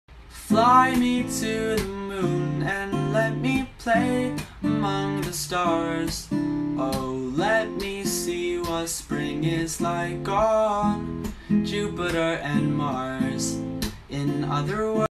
Instrumental

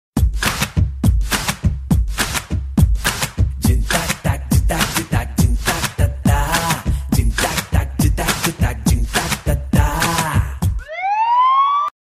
Tamil Ringtone

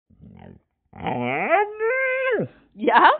Dog Whine

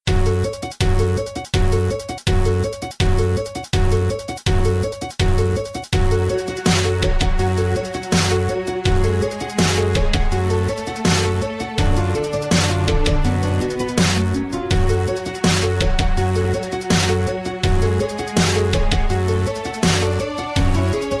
Hard Guitar Bass

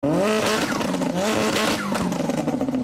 Subaru Turbo Flutter

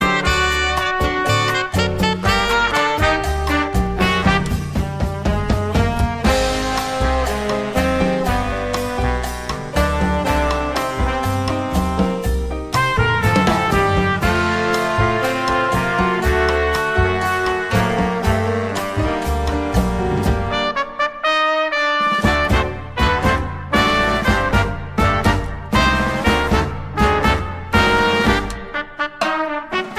Tango

